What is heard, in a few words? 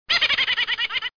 GIGGLE; DR BRAIN; BUGS